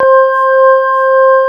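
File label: CX3 organ C5 L, Keyboards Old School; USB Soundscan vol.09 - Keyboards Old School; AKAI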